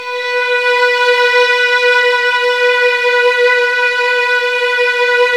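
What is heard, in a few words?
Violin Ensemble; Piano Layer, Grnd Str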